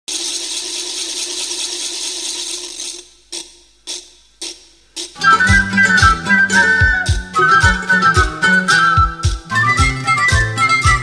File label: Morenada